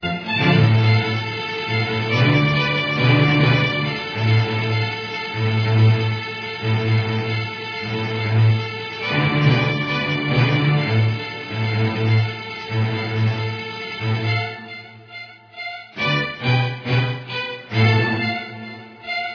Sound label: D Minor Dark Strings